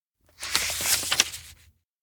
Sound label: oldnote page turn; anemoi